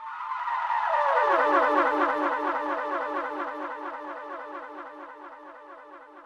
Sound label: Deathray Trumpet C3